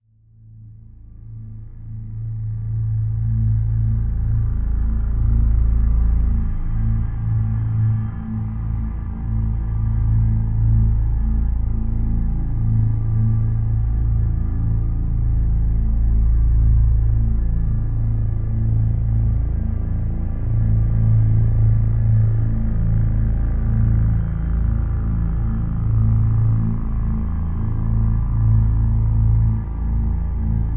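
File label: Drone Reactor A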